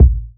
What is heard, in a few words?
boom kick drum A key, kick drum sample tuned to the A note, Loudest frequency: 90Hz; kick drum samples